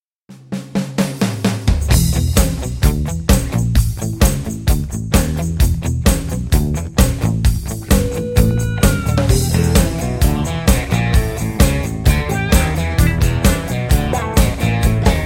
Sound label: StudioChor; Childrens Corner StudioChor